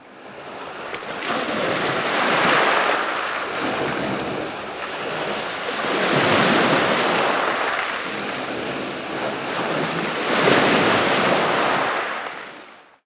SEA, SEA WATER; Best Service - XXL Production Effects

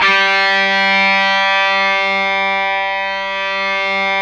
GTR, Dirty; Club-50 - Foundations Roland; Stack

